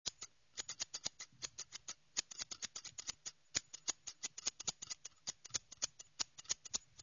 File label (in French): ciseaux